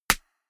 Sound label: clap, percussion